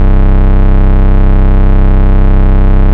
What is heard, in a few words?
Ultimate Bass Root C; Vengeance Samples